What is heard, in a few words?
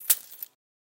other player cash out